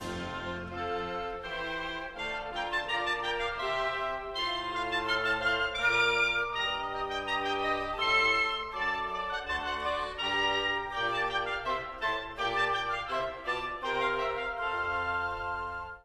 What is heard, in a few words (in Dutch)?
houtblazers